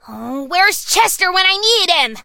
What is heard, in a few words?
hurt vo